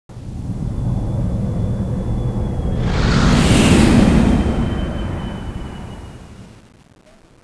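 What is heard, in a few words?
mothership flyover right; gearbox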